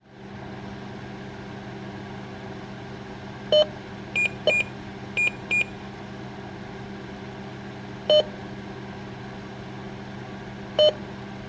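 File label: sounds of computer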